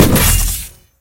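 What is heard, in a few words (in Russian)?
свист луча выпущенного из лазера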